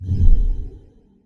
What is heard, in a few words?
Whoosh Woow